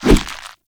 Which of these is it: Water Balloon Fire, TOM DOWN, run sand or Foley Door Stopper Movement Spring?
Water Balloon Fire